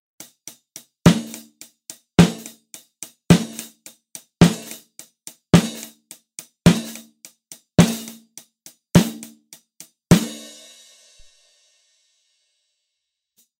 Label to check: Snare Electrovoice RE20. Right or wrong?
Right